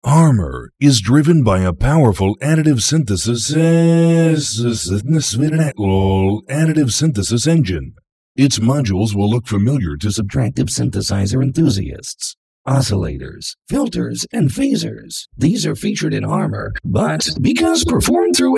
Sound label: Speech; IL Harmor